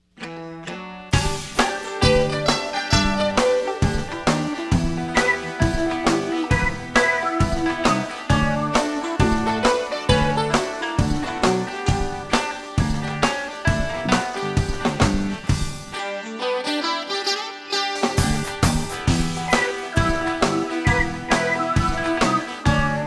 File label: hodown